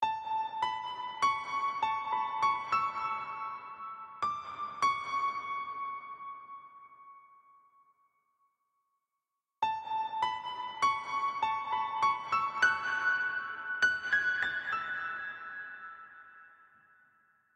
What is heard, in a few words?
Fill